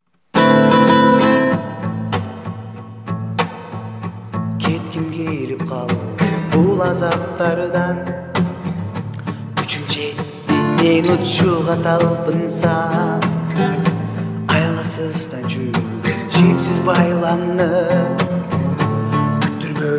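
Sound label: guitar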